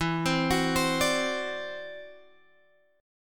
E 7th Suspended 2nd Sharp 5th, E, down, Guitar, DADGAD